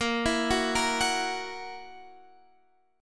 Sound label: E Suspended 2nd Flat 5th A sharp